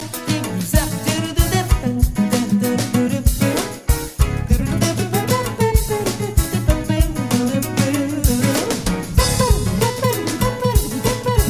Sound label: perfect solo guitar